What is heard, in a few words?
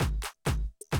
130, Classic DrumLoops